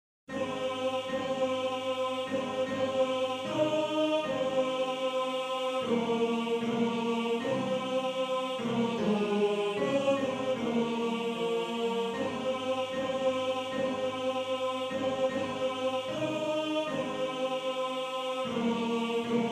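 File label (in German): Tenor 1, Männerchor; Wohin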